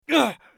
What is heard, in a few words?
bear wound; eft